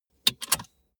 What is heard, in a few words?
car bmw e46 interior safety belt clasp release